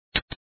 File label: switch